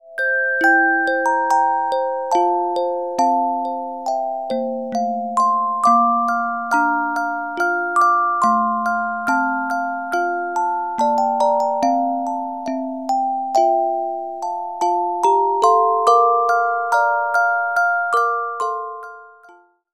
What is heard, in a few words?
Carillon Music Box Melodies